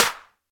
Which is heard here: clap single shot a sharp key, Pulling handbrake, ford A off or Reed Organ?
clap single shot a sharp key